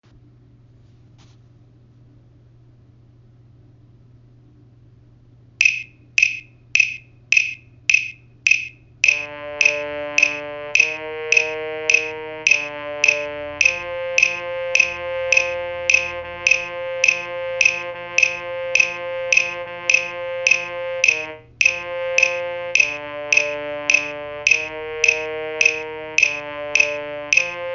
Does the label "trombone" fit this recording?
Yes